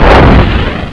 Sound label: fire, weapons